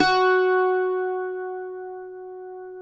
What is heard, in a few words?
cuatro F#; Spectrasonics; Hans Zimmer Guitars VOL-2